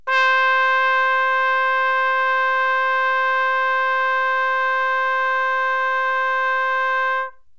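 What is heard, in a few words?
trumpet C; DO; conservatorio; Csound; tromba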